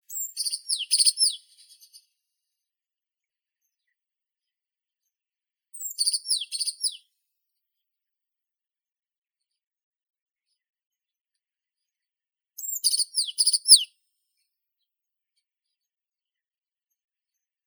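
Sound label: azure tit Cyanistes cyanus, nature sounds; lazorevka belaya; knyazek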